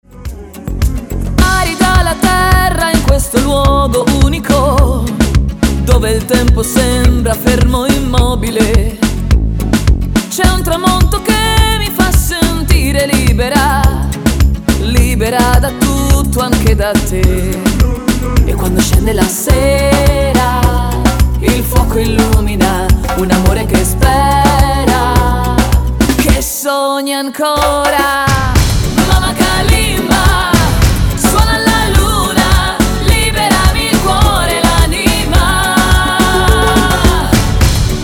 KALIMBA

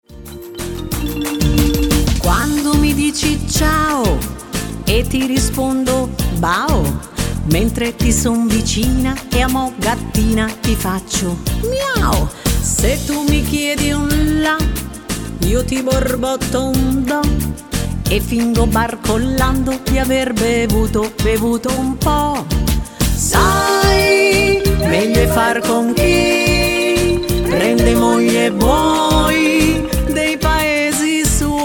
karaoke

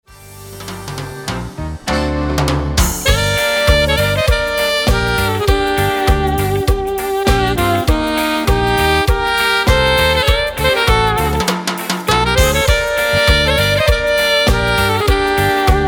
CUMBIA REGGAE

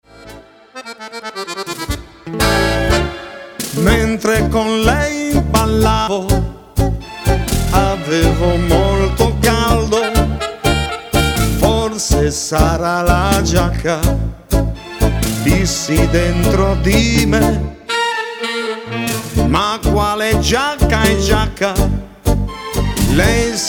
Tango

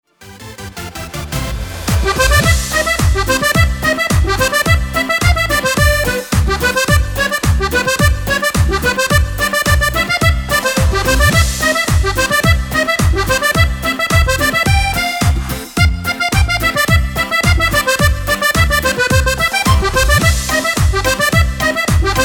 cumbia; cumbia strumentale; fisarmonica

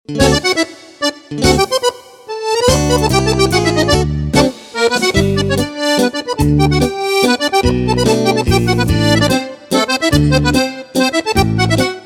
mazurka

